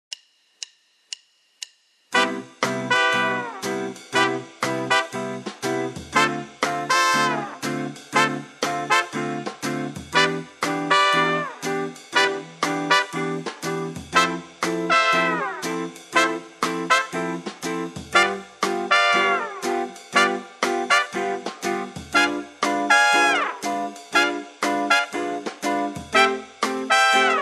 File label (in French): modes bebop majeure accompagnement tempo 120; exercices; gamme bebop majeure